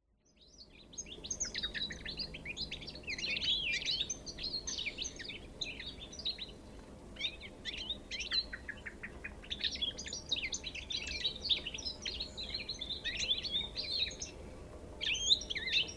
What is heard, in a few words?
Carduelis carduelis; SETE